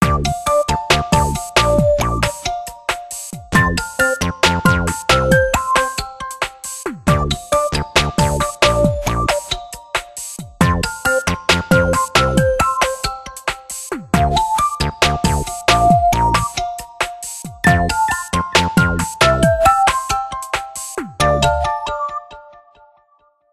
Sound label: Ring Electro Soul; Microsoft; Windows Mobile ringtones